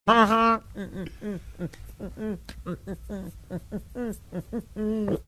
trying to hum song while pinching nose

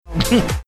making funny sound

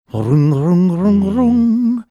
making flashback harp sounds

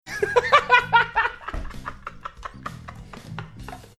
laughing

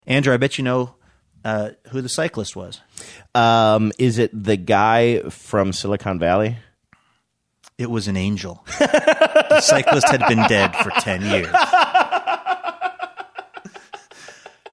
having good laugh; bicyclist joke

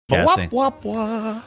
singing something

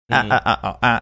mimicking

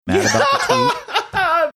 cute laugh

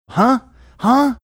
huh huh

